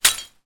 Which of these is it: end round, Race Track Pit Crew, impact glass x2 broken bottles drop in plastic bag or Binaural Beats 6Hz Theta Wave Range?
impact glass x2 broken bottles drop in plastic bag